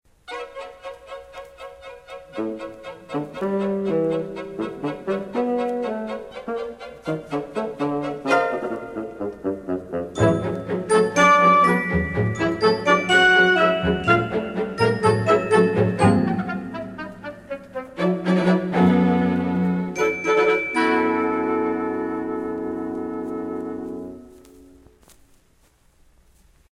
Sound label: Light Mechanical, Cartoon Music; Cartoon Music Discs